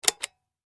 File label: Push Button Plastic